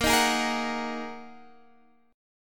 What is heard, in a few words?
A sharp 7th Suspended 4th Sharp 5th, Guitar DADGAD chord